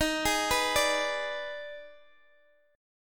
D sharp Major 7th Suspended 4th Sharp 5th D sharp Guitar DADGAD, chord; x,x,13,13,14,12; chordshape